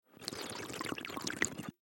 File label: Human Drink Slurp Water; Slurping